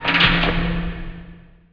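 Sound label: ambience crane winch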